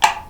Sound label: Switch off